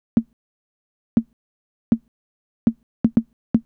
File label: conga Denon; hydrogen